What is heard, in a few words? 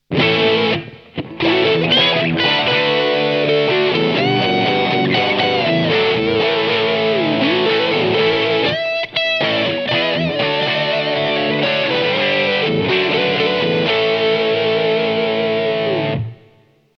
Maxon TOD9